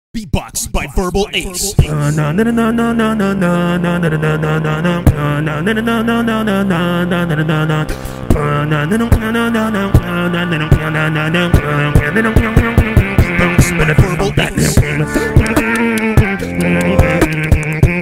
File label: beatbox; batman